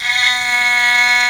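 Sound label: haegeum G2, Korean; AKAI S6000 CD-ROM - Volume 1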